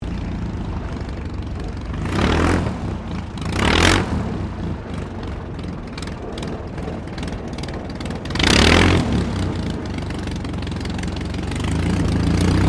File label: AIRCRAFT DH82A DEHAVILAND TIGERMOTH TAXI SHUTDOWN; RAAF Tigermoth; Airplanes (Sound Effects:Vehicles)